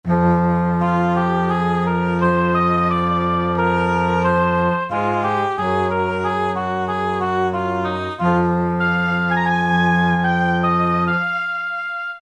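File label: orchestra Strings + Winds, E-mu PK-6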